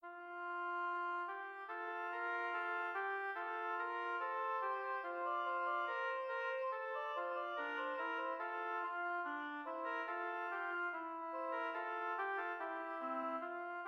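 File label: oboe canon; mozart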